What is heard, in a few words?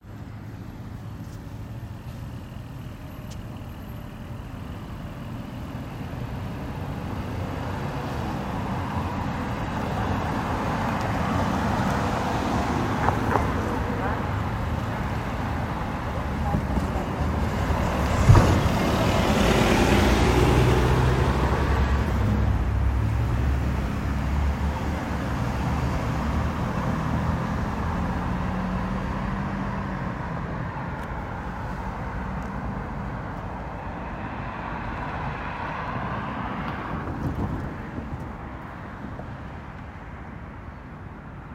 Intersection; Location: Hempstead Turnpike – Oak Street Intersection (10:01 pm)